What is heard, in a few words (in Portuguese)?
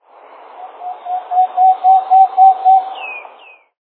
tovaca-patinho